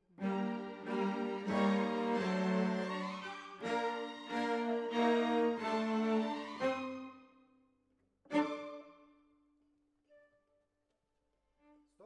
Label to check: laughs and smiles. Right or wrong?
Wrong